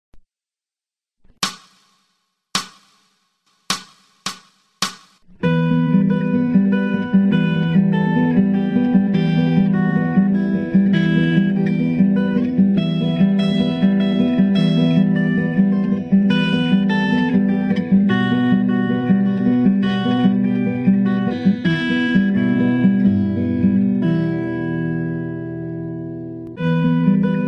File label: Romanza FAST 2X 90, Gitara